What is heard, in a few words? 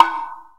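kotsuzumi; AKAI S6000 CD-ROM - Volume 5; TUZUMI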